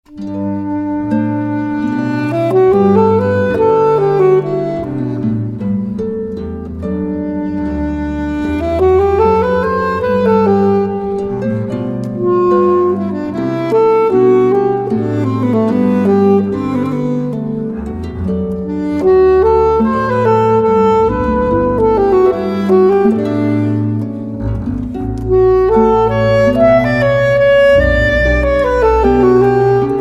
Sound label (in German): adagio man non tanto altsaxophon